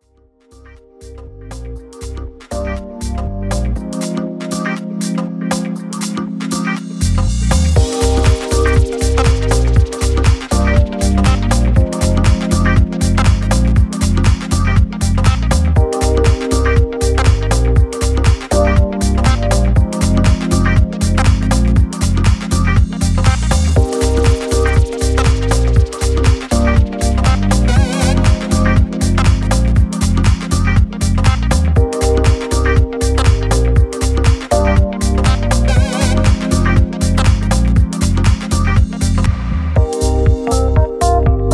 electric 88 piano; waves

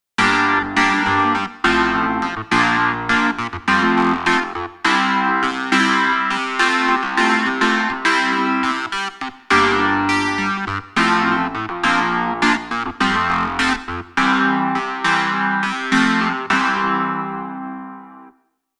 Vintage EP; Fusor Preset KB

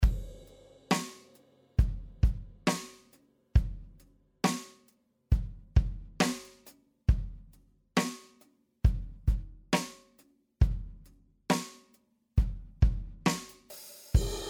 EDM country 68bpm

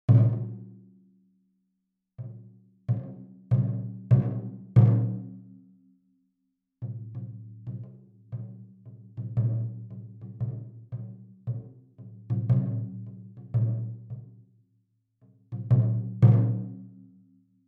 taiko Chudaiko Stick touch; fxpansion

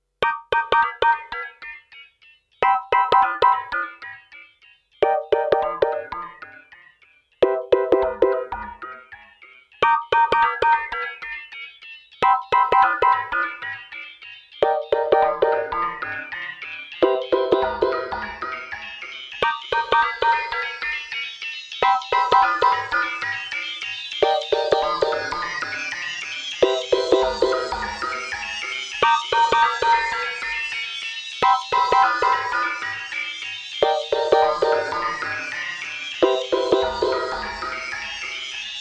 Simple Synth

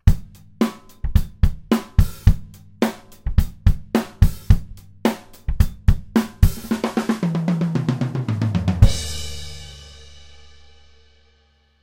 Drum Overheads; earthworks TC25

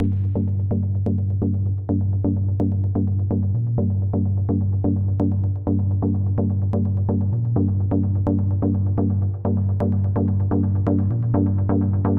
3Osc Saw Stab; Fusor Preset LD

